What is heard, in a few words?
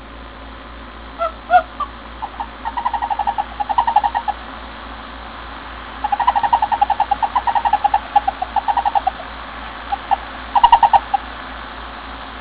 male bombina, frogs